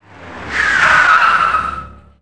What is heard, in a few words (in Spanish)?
coche; primartis; sonidos